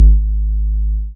BAS MINI, Mini-Moog

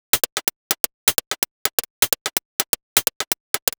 Hihat 127bpm, House Drumloops

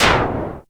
NOISE FX; Back In Time Records; Big Bang; SFX

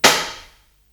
Hihat Foot, Psychedelic Drums

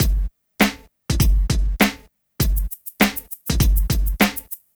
Street Tip, R&B Drums

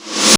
Snare Rev, Korg 05R-W Korg Snares; 300 Drum Machines

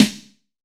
SN, Snare; AKAI S6000 CD-ROM - Volume 4

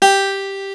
PIANO G3; PIANOS

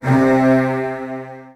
C2, Virtuoso Strings, 16TH; ILIO; Kirk Hunter; CL 16TH

